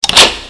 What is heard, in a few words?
door lock; cs2d; cityrp; MCity